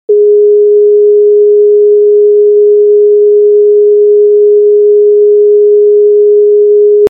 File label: g sharp 415